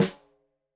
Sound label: Snare Stereo, Drums; Deeply Sampled Drums